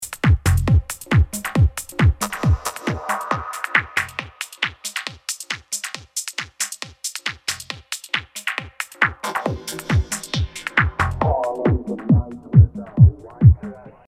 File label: wah